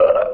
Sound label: burp short